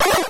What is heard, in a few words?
eat Bird; Dino Run